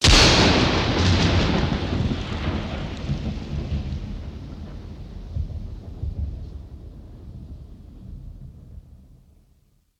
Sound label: Thunder Crack